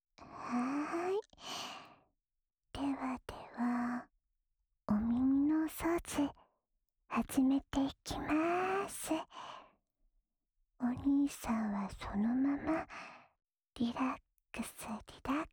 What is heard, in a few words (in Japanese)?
普通の耳かき（左耳）